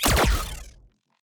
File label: Shooting; DungeonShooting; 添加激光武器音效，还有敌人使用激光武器